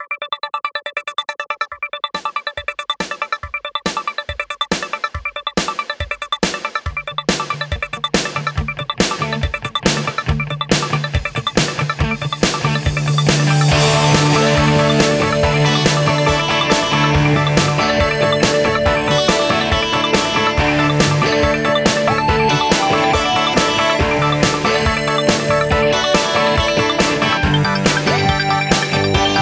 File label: 80s Pop